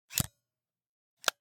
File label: Camera, Pentax KS2, DSLR, mechanical, flash up, close, XY; Eiravaein Works; Vaeyan IV